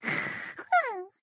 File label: Monster Sleep; Cut the Rope: Time Travel